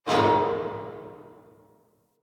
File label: Parking Lot Metal Door Distant